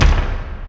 Blast; Gangsta Sound Kit

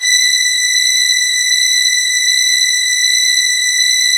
Synths HiString H; Vengeance Samples

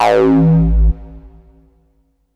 303 Acid A; Vengeance Samples

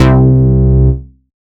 Bass C, Offbeat Bass; vengeance essential club sounds-3

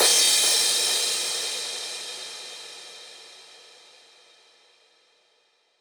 Crash 138BPM, Drums & Perc; F major; Vengeance Samples; Another Day Kit

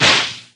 Claps; Vengeance Samples

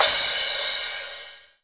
Crash, Cymbals; Vengeance Samples; VEH2

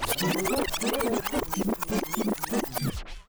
back spin long, vinyl record

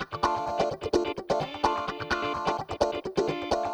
Electric Guitar - D min, 128BPM; Vengeance Samples; VEH3 Electric Guitar Kit 2

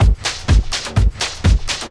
drum loop 125, flying filter, vocal house; PS-28 Vocal House Samples; drumloops

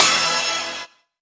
Synths E; Vengeance Samples

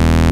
Synth Bass; Vengeance Samples; VEE Synth Shots

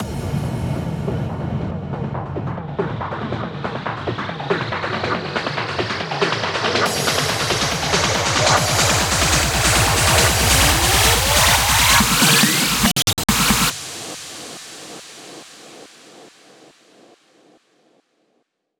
140BPM 8Bars, HandsUp & Trance Fills; Foundation; Vengeance Samples

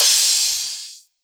Crash; Vengeance Samples; VES1 Cymbals

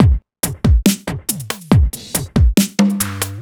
Slomo Beat 140 BPM, Loops, Slow Motion Beats

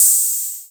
Cymbals HH Open; Vengeance Samples

